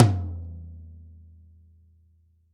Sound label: Tom